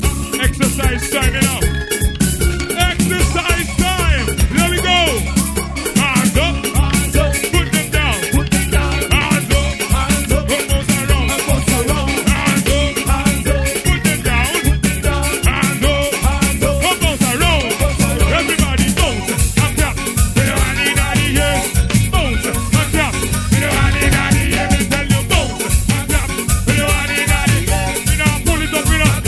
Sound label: bounce & clap